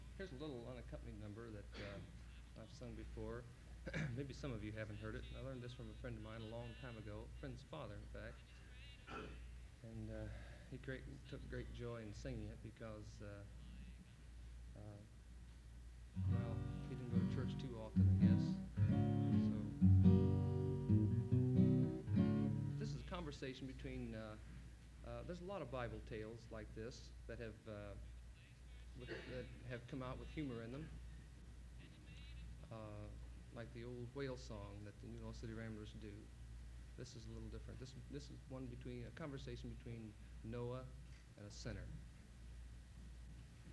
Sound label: Applause